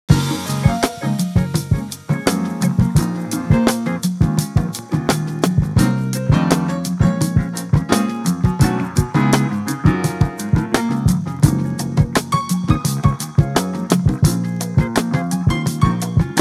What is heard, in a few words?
jazz